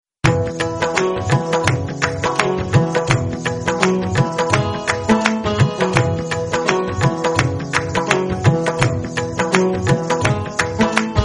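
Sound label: instrumental ringtone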